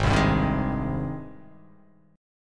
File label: G sharp Major 7th G sharp